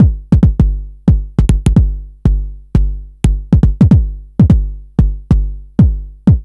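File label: Roland Tr-909 bass drum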